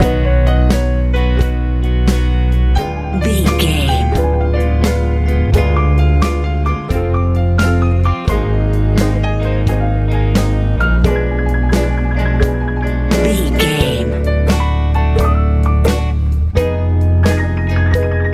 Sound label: pop